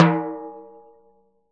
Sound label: TIMBHI EDG, Synclavier Percussion; ILIO; Synclavier Percussion 2; World & Orchestral